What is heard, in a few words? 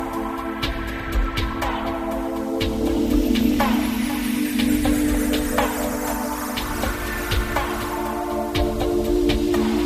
ster